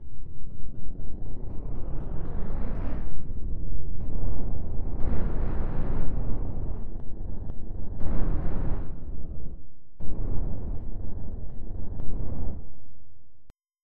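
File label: Rumble, synth fx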